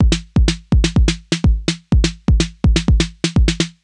drum loop ohnine stripped 125, Classic Chicago House; Sample Magic; Drum Loops